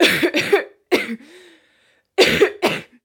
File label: human coughing female 25 years; sound effects one